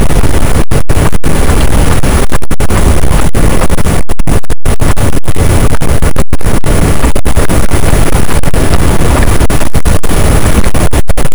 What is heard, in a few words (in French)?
cachalot, boat; Physeter Macrocephalus